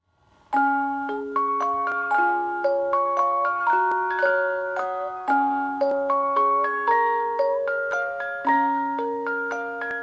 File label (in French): valse 30 lames japonais; de Brahms